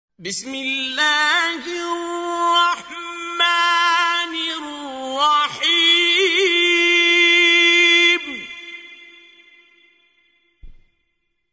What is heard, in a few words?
Recite, qari quran; Surah Lahab; Qari Abdul Basit